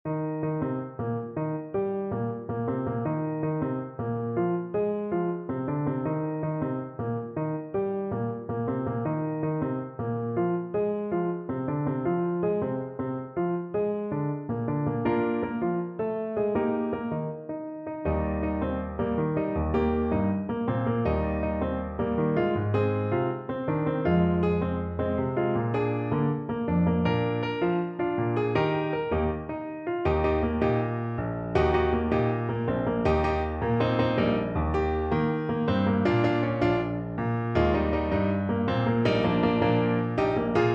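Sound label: salsa alto saxophone; jingle bells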